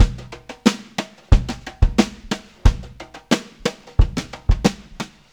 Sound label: Drum loops